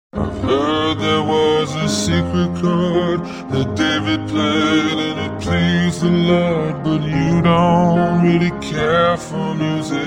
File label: Opera singer, Sing